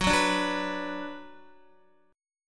F sharp Suspended 4th Sharp 5th, Guitar; strummed; x,9,6,7,6,7; down; 4F,4A,5C,5G,5C,6E

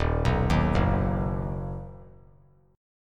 Eb Minor Sharp 5th; Ebm#5 arpeggiated; JGuitar

